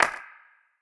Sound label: clap sound g key, Royality free clap sample tuned to the G note. Loudest frequency: 2177Hz; percussion; single hits one shots